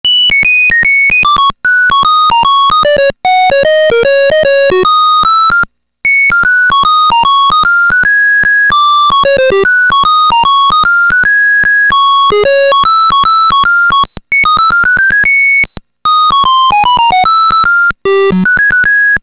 beeper, pc

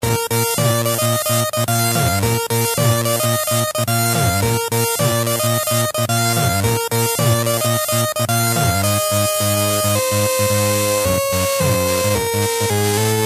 speaker, pc; Turbo Outrun

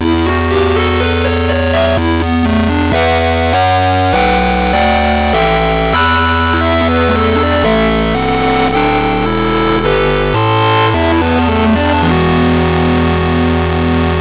tandy 3 voices

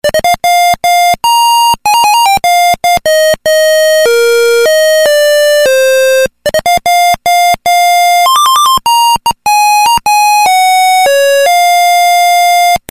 beeper, pc; Silpheed

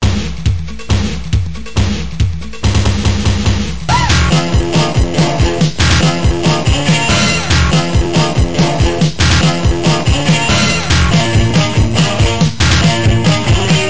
Space Racer Intro, pc sound

